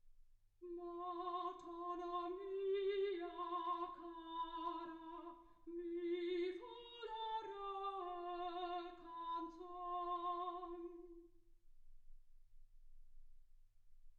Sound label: soprano